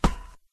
metal, Footsteps; Barotrauma

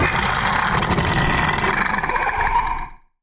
death; Barotrauma; Barotraumatic; Crook

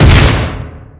flak explode; Barotrauma; barotrauma ek

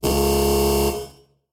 Alarm Buzzer; Barotrauma; TSFX

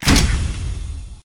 harpoon, Weapons; Barotrauma; Subsurface

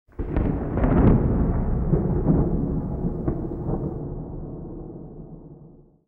thunder far; Environment; EK modern

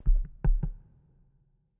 death; Barotrauma; Barotraumatic; Caver

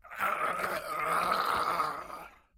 clown death gurgle; Barotraumatic; Deepseaclownnew

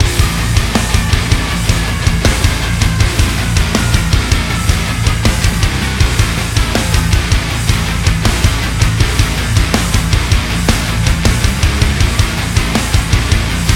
Rock; Barotrauma; DynamicEuropa

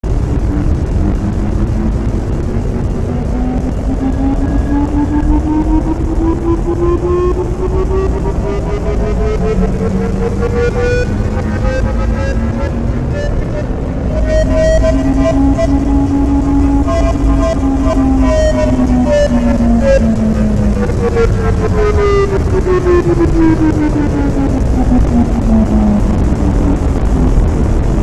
interference loop closer; Barotraumatic; Carrier Leviathan

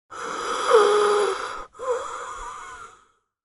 male wheeze; Barotrauma; Real Sonar

